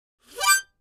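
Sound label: Mouth Organ Short Fast, harmonica; woodwinds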